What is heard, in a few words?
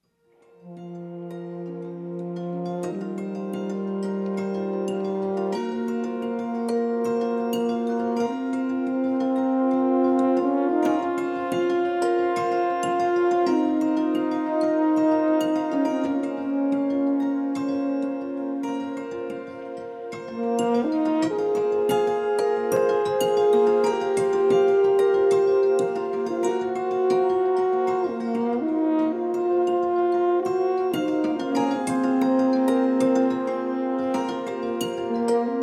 Tap Jam Horns